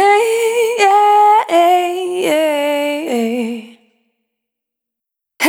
Spin, Single Voice Melodic Loops

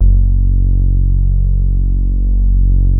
BASSES

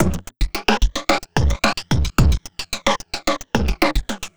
Noise Beat; Drums & Drones

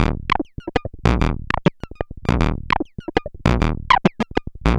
perc stupid, Percussive Loops, 100 bpm; Sample Magic - Transmission-X

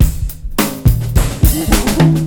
DRM, Breakbeat

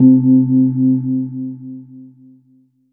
Asian Gong; Cymbals

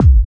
Kick, Kickdrum; Equipped Music; Loungin House

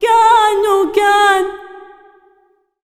SINGER, Arabian Traditions

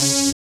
SYN, DANCE SYNTH

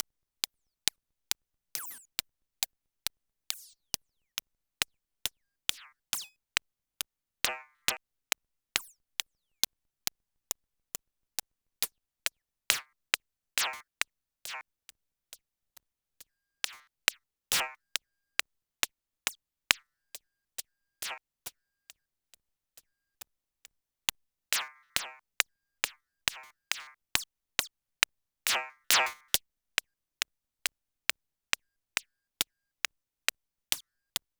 Perc; Noise Engineering Basimilus Iteritas

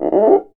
CUICA; ILIO

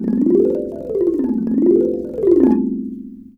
RANATTHUN; Spectrasonics - Heart of Asia CD2

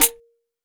Pandeiro; Roland BK-5; Percussion

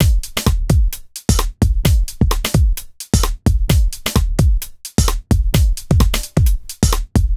Solid Loop 130, Loungin House; Equipped Music

